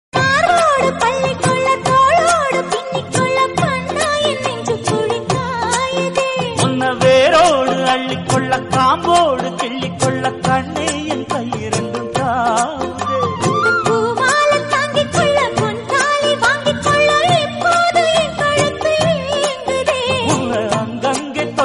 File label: Tamil Song